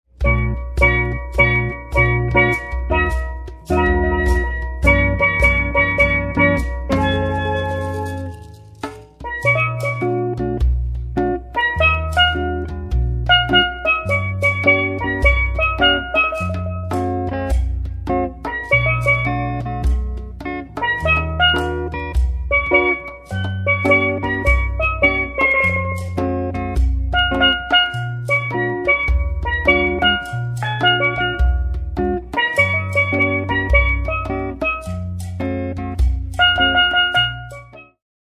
Caribbean Band

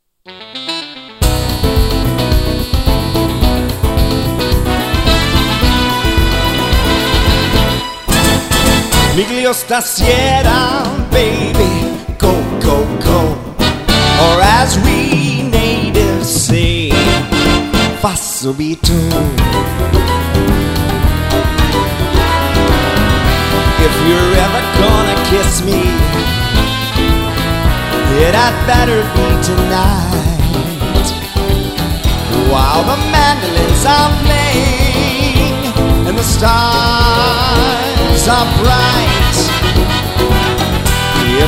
Singer; Las Vegas

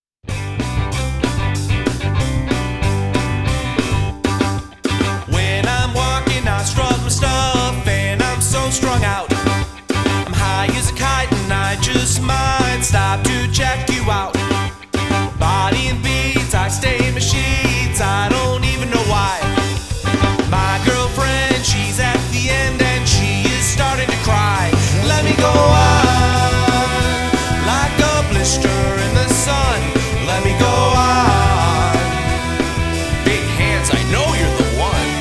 Variety Band; Chicago

